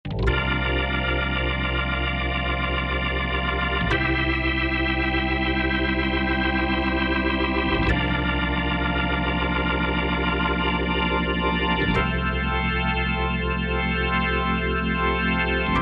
Korg TR-Rack; Full BX3

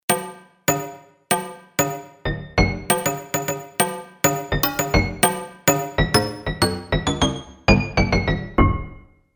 Hammer Bell, Roland JV-880; Factory Patches

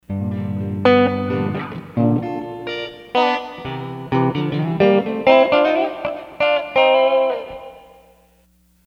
Jazz C-Mix; Sony HR-GP5

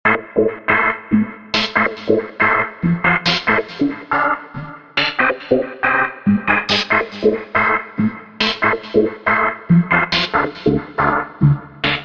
Cosmo Arp, Roland V-Synth; Factory Patches

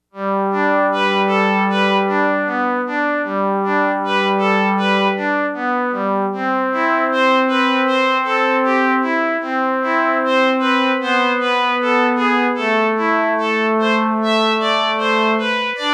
Richstring, Yamaha DX100; Factory Patches

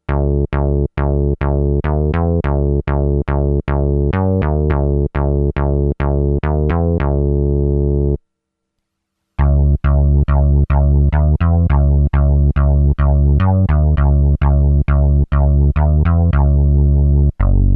STEREO FLANGE

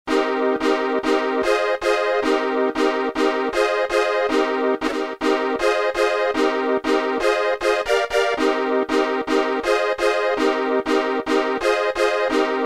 Minor Rave; Roland M-DC1

